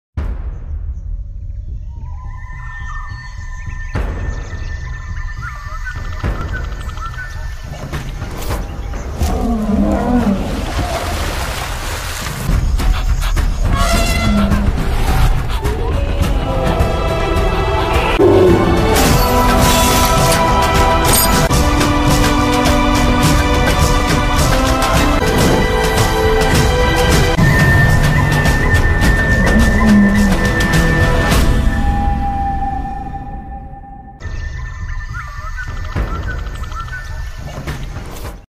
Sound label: bgm dolby atmos sound